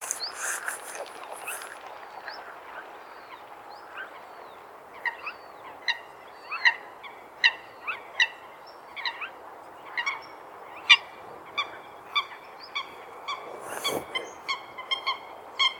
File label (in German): tuepfelsumpfhuhn